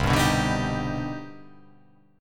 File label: C Minor Major 9th, chord